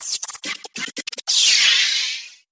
Phased Cymbals Alert

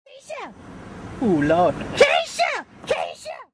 drive by screams

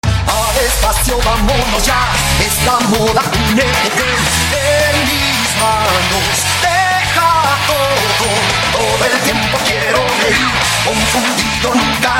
sms

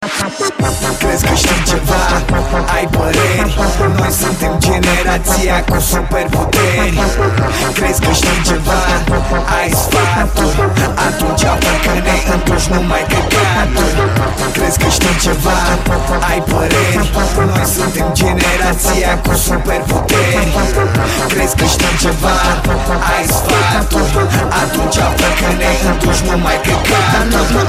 arabic hip hop

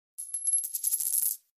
3d coin

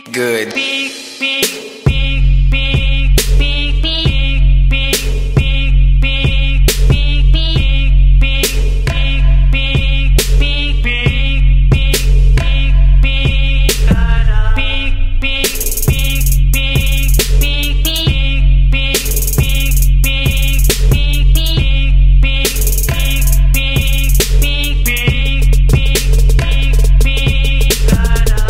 Trap Remix

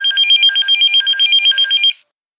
Phone; Orphan Black

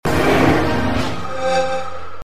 xbox 360 start up

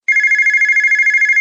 ring

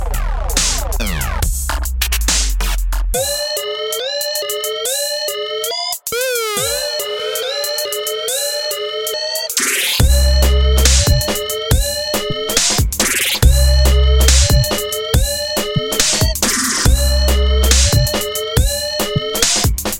Dubstep Grime Beat

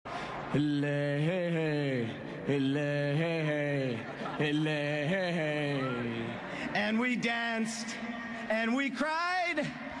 singing

